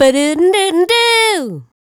Buh Doo & Doo, Vocal Lix, Swingin' Chick Loops & Lix